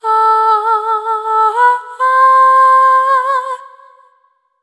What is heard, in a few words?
Shared Faith, vocal samples; Sonic Foundry (Sony Creative Software) - Pocket Diva World Class Vocal Samples; Op-Erratic Loops